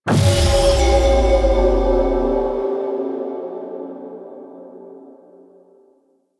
Platform Activate Fail; Warframe; Cephalon Weave

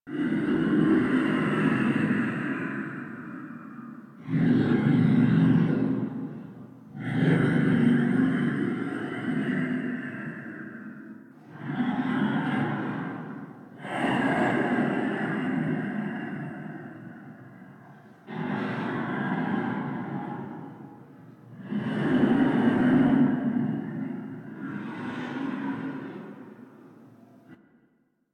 Dormant Vessel Alternate Breathing Loop; Props; Ambience

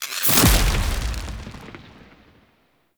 Shock Mote Strike

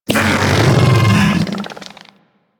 bug vocal death; Warframe; Hunting Critters; Inf K Drive Bug

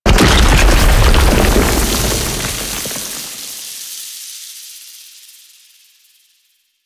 Octopede Death Dissolve; Warframe; Man In The Wall

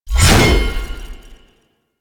Sword Swipe; Warframe; Weapons; Melee; Whooshes; Ballas Sword

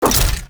Orb Shot End; Duviri Medusa Puzzle

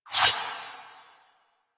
Fast Travel Map Open; Warframe; Open World Fast Travel

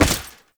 Step Default, Foley, Footsteps; Enemies; Warframe; Duviri; Dax

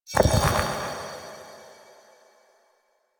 Go Board Win; Warframe; Sacrifice quest

